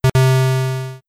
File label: Startup; Windows Command 2.0; Windows Never Released Soundpack 1; deviantart